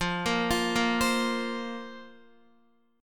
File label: F Suspended 4th F, Guitar; Fsus4 arpeggiated; x,8,10,10,10,8; JGuitar